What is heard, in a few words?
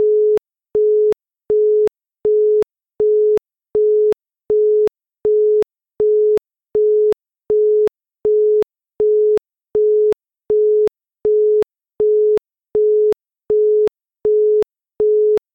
Au Busy, tones